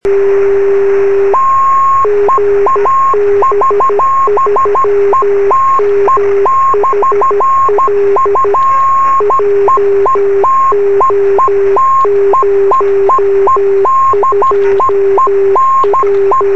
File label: beacon 23cm; sm7lcb; gb3mhl